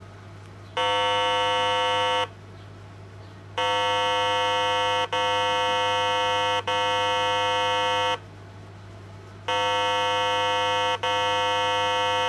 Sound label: low tone beep